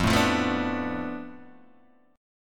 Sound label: F 6th Flat 5th F down Guitar DADGAD, chord